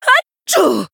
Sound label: female troll, Attack